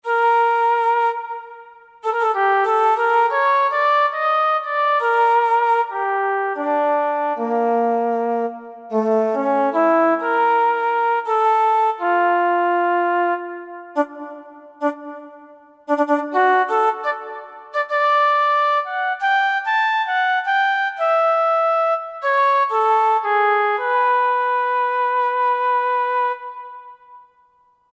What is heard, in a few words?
Flute, Roland JV-2080